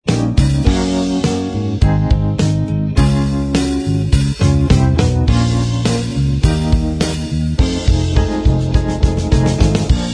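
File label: instrumental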